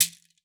CABASA; Xsample VOL-9

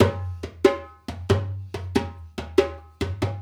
Dumbek; Essential Percussion

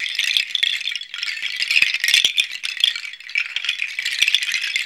RATTLER, RATTLERS; Zero-G Ambient 1

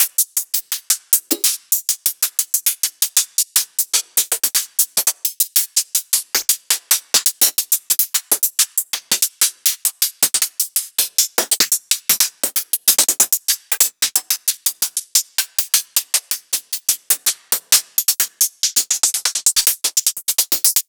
Closed Hats; Ghosthack

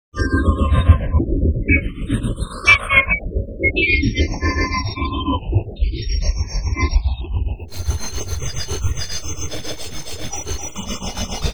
SILICAT SEQ, Disjointed Textures; USB Soundscan vol.33; AKAI; CHAOS

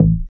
DR. LOOP, BASS; X-Static Goldmine 3

